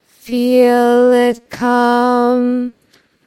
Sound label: singing